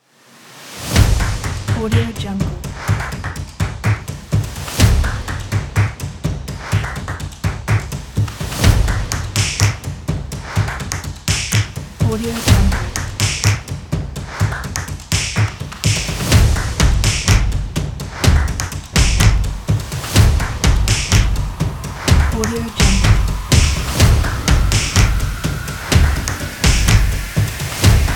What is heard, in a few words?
claps snaps action drums